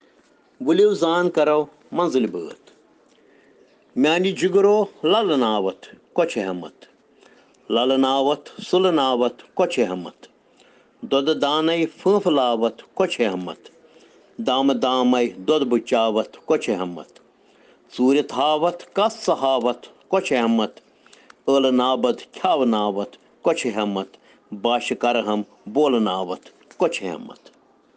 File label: Children Rhyms in Kashmiri